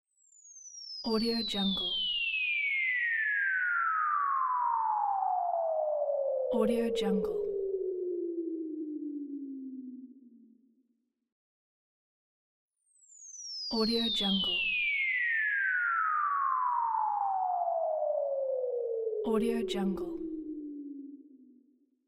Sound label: cartoon falling